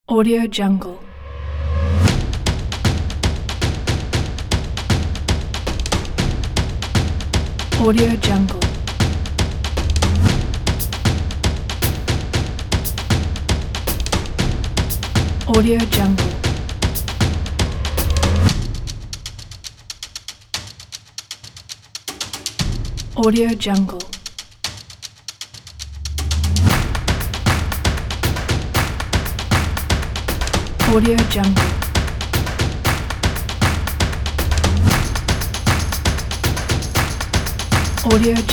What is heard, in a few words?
drums background